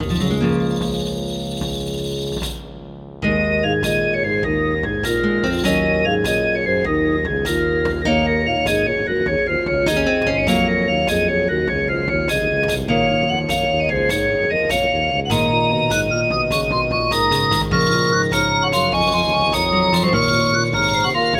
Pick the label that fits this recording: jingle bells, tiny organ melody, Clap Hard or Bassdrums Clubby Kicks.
tiny organ melody